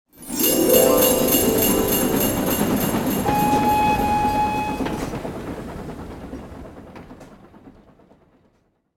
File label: podium SFX holiday